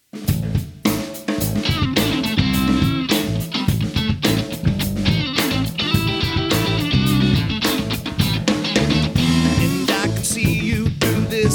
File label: (new vocals)